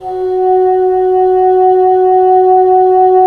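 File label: wind bassoon; LA Composer vol. 3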